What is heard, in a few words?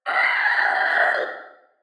dino screech